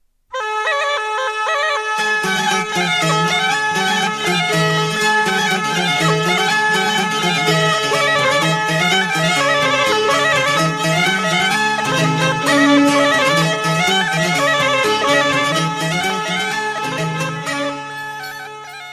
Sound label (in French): Bourree - France